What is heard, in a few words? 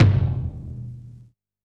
Vinyl Tom